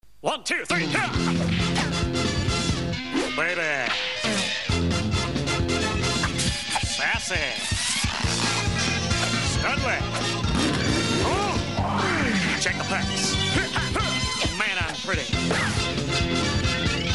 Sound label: Start Song